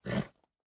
horse grunt

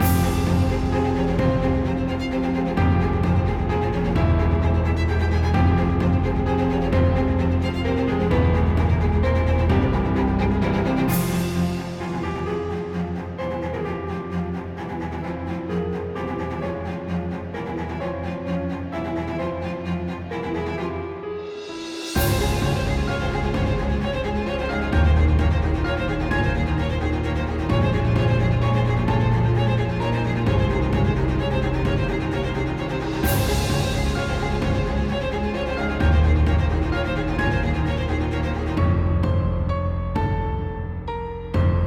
trailer battle

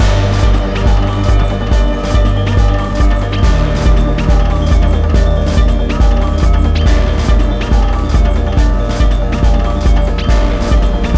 Gamelan techno Medium; rive wreck hack die retry; ps4

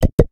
Button Tap Sound; Jolly Battle

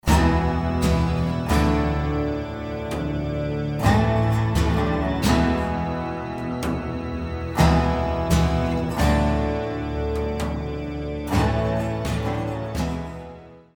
Sting Hardburn Short; Firefly Online Cortex; Windows gamerip 2015

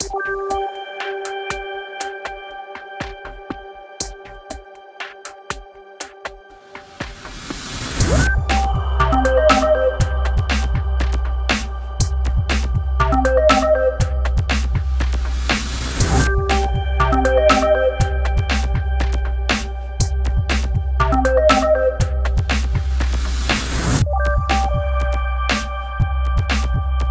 Ship Song; Stratos

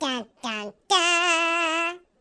scratch belt da-da-dah; The Croods; Scratch Belt CS

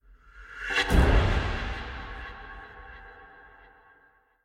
Jump Scare End; We Happy Few

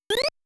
Boss -Stricken; Galaga

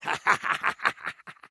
Attack grunt; We Happy Few

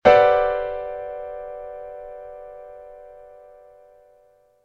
musical piano chord g major 11th; Retsnom; android

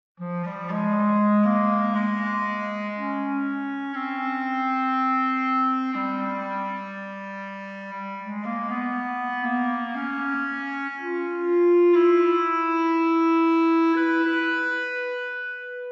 Clarinet; Toki Tori 2

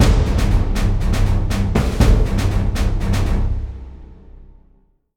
MUSIC EFFECT Orchestral Battle Neutral stereo; gamerip; Heretic Operative

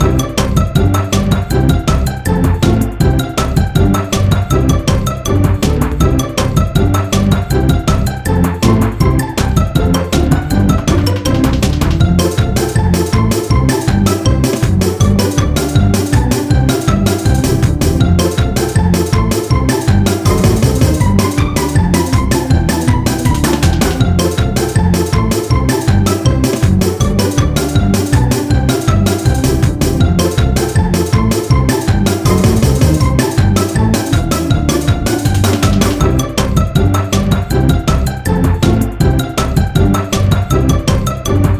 Paperbag music

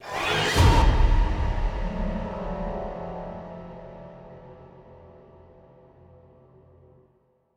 shock riser; Joe's Diner

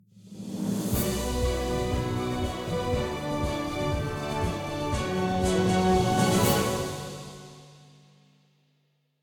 Epilogue Music; Prison Architect

